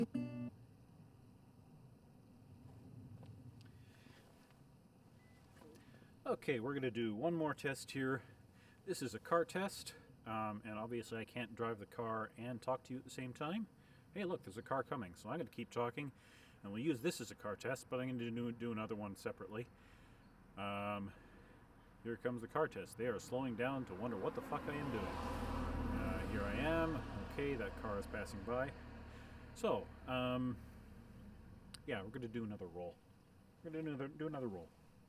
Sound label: Car Bogey SHOTGUN; SHOTGUN SHOOTOUT